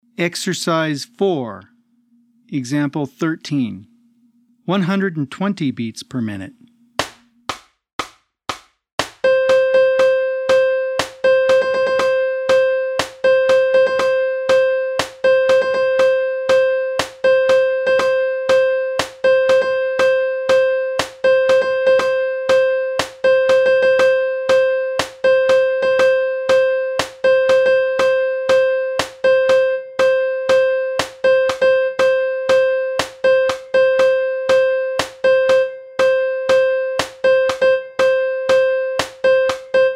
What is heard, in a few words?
120 BPM